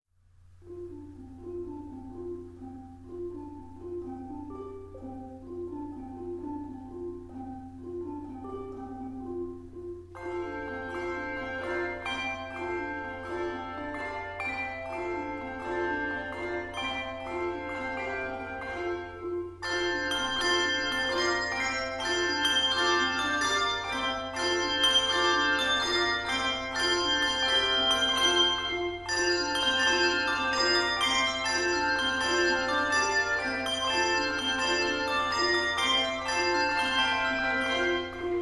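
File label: Handbells; Chorister's Guild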